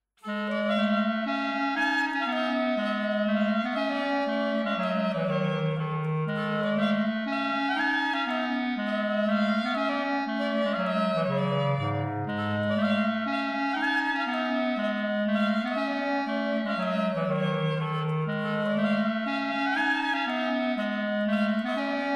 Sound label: Mazurka; West End